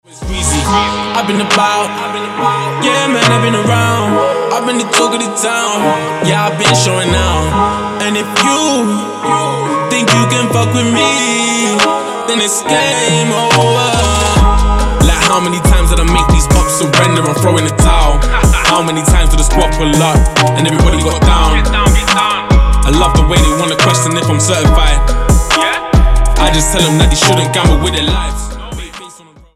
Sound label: Game Over